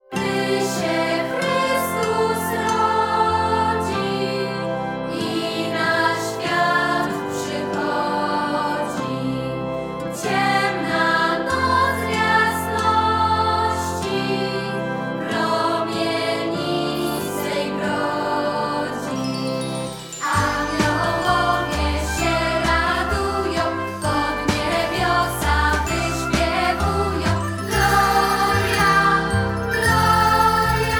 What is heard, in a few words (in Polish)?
wersja wokalna; GDY SIĘ CHRYSTUS RODZI